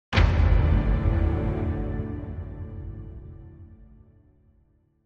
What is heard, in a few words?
Hit Focused Chord; Trailer; LYN115 Trailer Production Elements - Hit 05 - Final,Focused,Chord